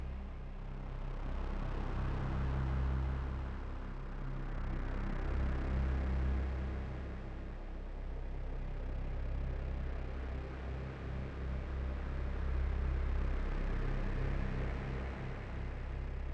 bright lead synth bass long tone vibrant LOOP

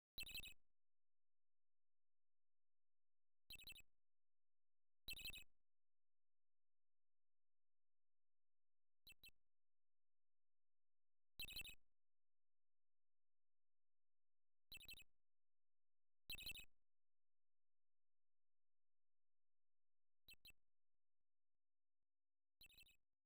Chirp; pc-9801

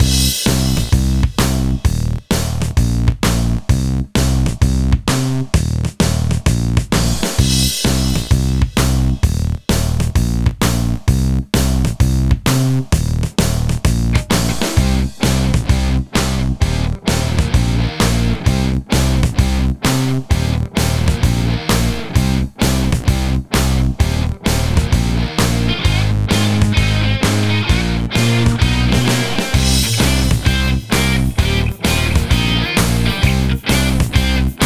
Rock

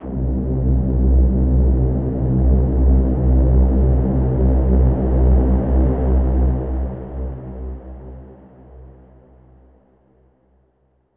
Release Chase Overlay; Mirror's Edge Catalyst

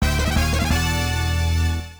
Fanfare; Lethal Enforcers

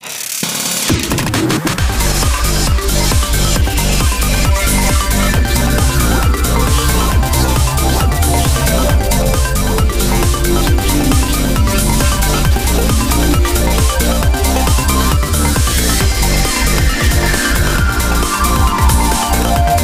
Jingle Online; Gran Turismo 6

